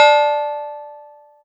Cowbell

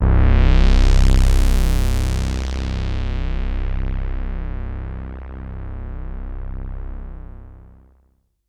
SYNTH Track Drop

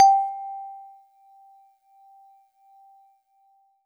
Celeste DX100 G-1; Pristine Celeste

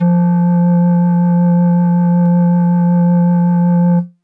Pan Flute DX100 F2

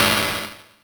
Hihat Open FX

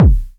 KICK

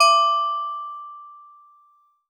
Steel Drums DX100 D5

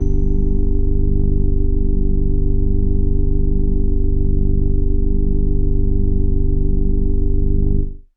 Indie Organ Kawaii G1

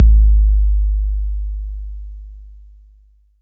BD 808 Tape Decay

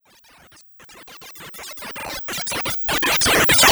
Sine FX

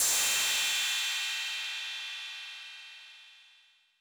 Cym B 808 Sat Decay B, Cymbal, Multi Saturated

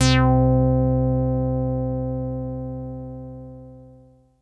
BASS Sharpy Acido Bass High

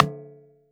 Rim Shot

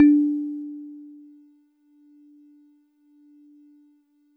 Warble Celeste DX100 D3

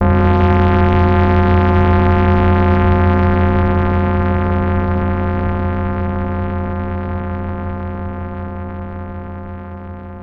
FX Radio Phonic; Smurry

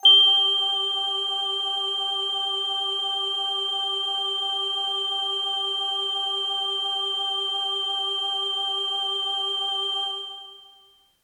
Bell Air Kawaii G-1

